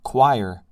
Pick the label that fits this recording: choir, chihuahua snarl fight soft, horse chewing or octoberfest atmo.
choir